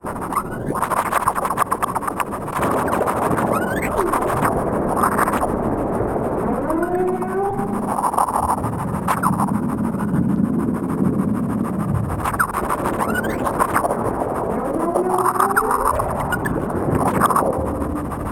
Syntakt FX Track into OT with a couple of downpitched copies and some reverb, lofi, comb filter and compression on the master track; Atmo for days!